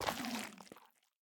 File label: Sculk step